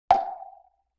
Temple Block Hit Roomy; Vintage Cartoon; Drums & Percussion

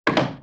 Wood Hit

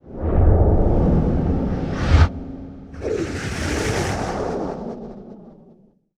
Whoosh Synth Composite, Horror; Sound Design

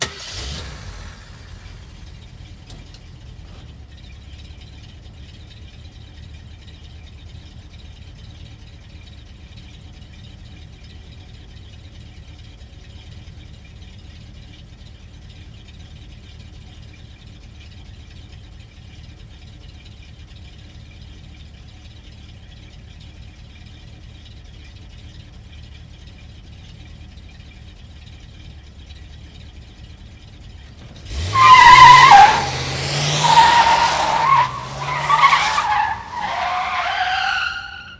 Car Start Idle Fishtail Out; Cars & Trucks; Vehicles

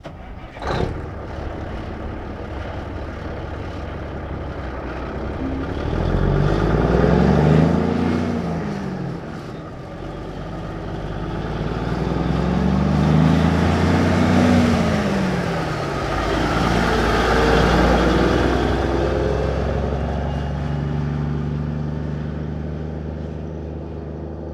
1954 Big Rig Ext Start Idle Away, Period Vehicles

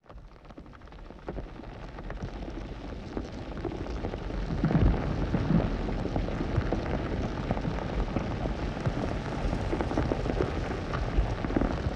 Landslide Rock & Debris Lite; Impacts

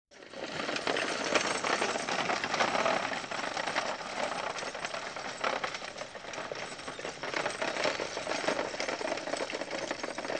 Stage Coach Slow By; Period Vehicles

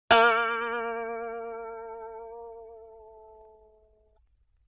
Boing Box High; Vintage Cartoon; Hits & Tones

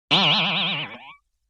Boing Box; Vintage Cartoon; Hits & Tones

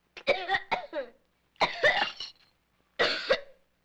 LoFi Child Choking Cough

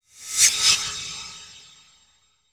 Flash Frame, Title Sequence Effects

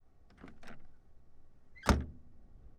1929 Sedan Door Open Close; Period Vehicles

